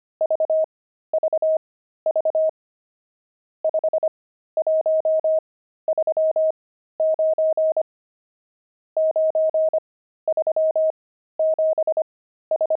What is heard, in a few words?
pangram, intermediate pangrams files